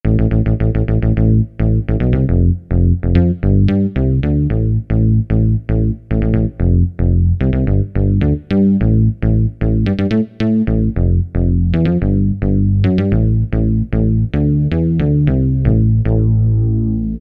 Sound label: Touch Mini Bass